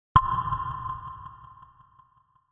Tone

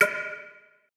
Percussion; Shockwave; Cymatics; House Starter Pack

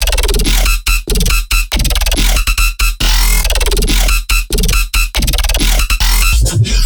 Bass Loop 140 E; Trap and Dubstep; Junction; Ghosthack; Free Trap and Dubstep Samples 2018

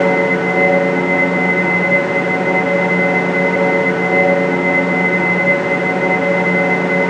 Texture Loop, Techno

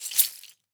Stacked Shakers; World Percussion; Cymatics; Emperor World Percussion

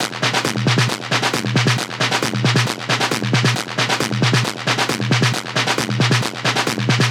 Perc Loop 135, Techno

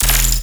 Bass Hit E, Dubstep; Glare Bass; Ghosthack; AC2024 - Day 13 - Dubstep Sounds 2025

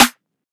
Snare; Bog; Cymatics; GHOST (Beta Pack); Drum Kit

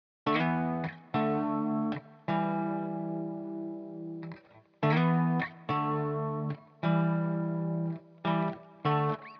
105 BPM E Min Backing Guitar; Guitar Pack; Loop Stems; Cymatics; Miami Guitar Pack - Pt 1; Frisbee

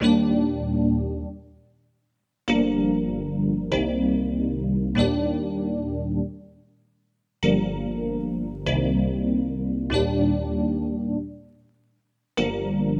Chords, 97 BPM G Min; Desires

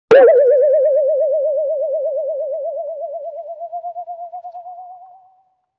Saw Wobble, Vintage Cartoon

